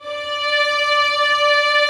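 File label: Vio legato D4; Digital Sound Factory Virtuoso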